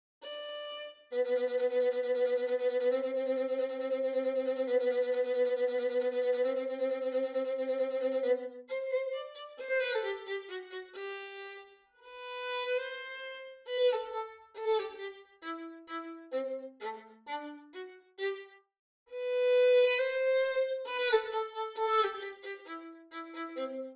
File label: Violin; Eine Klein Nachtmusik prepared for ctools by Shegolev